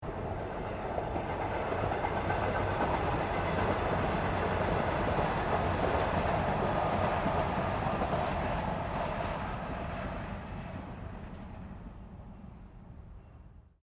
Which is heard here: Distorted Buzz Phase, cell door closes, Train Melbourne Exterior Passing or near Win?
Train Melbourne Exterior Passing